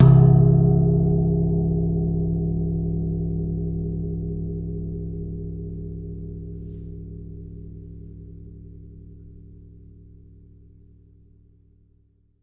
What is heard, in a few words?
Long Gong Single Hit; Longevity Gong; ETHNIC and WORLD PERCUSSION; Longevity Gong by Amani